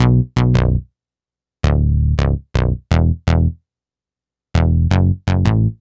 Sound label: Classic Bass, Drum N Bass